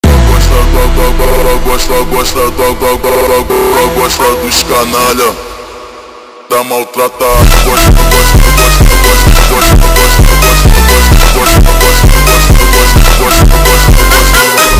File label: Phonk